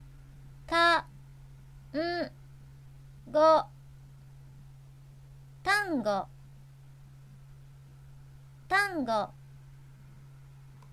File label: tango